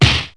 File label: Hard Strike